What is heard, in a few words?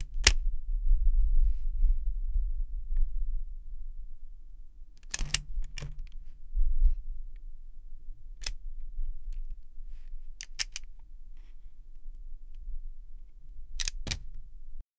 taser sound going on; electric